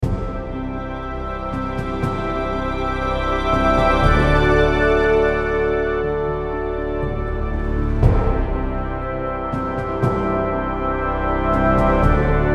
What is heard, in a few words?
Background, podcast music